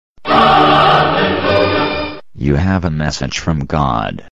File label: sony mesage tone; ringtones; sms